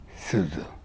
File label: suzu